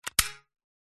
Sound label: office staple gun metal fire dry